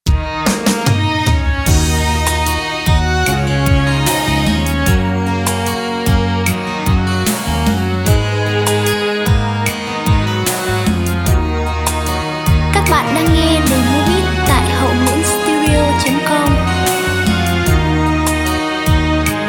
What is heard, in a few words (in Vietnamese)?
beat